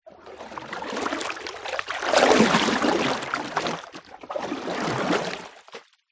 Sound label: nature water deep movement surface